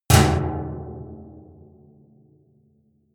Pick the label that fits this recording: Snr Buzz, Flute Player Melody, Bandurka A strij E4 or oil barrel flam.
oil barrel flam